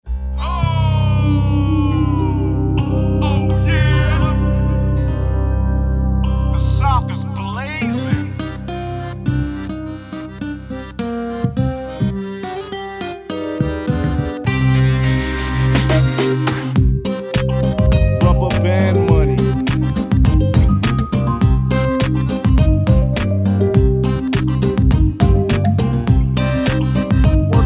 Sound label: Polynesia reggaton, rasta reggae